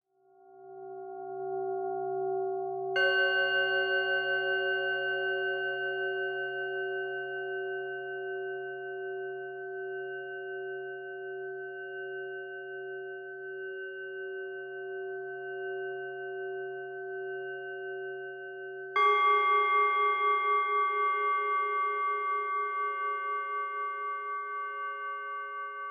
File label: Singing Bowls High Tone